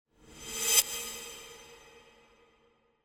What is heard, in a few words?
Whoosh Metallic Thin Attention Grabber; Hollywood Edge; sound designer's toolkit v2